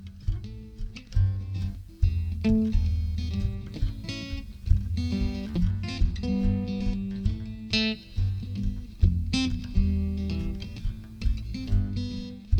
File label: instrumental; r1 studio sessions